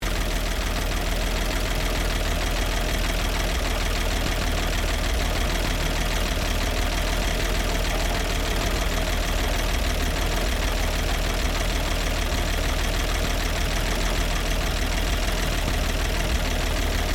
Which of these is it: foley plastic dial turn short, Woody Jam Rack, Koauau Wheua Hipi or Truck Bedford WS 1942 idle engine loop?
Truck Bedford WS 1942 idle engine loop